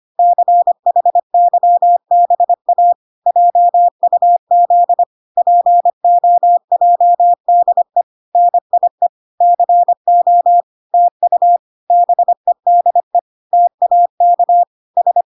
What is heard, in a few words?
25wpm